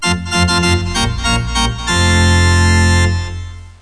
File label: DRUMBEAT; My Science Fair